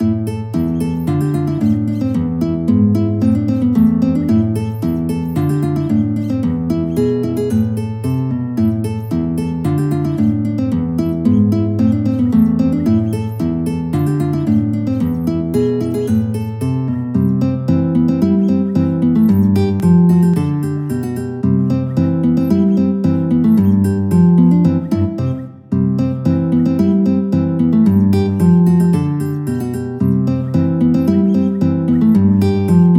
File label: polkka, guitar